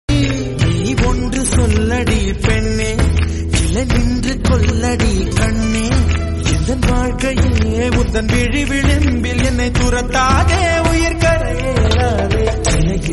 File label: Tamil Ringtone